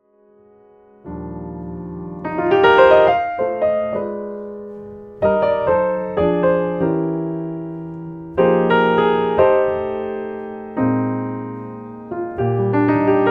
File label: Strand Solo